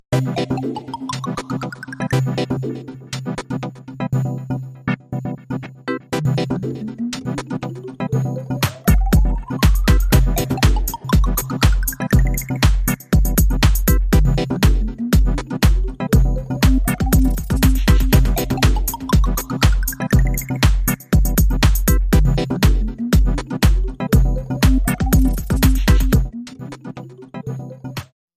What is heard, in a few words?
Lounge Trailer